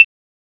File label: CLAVES, CMI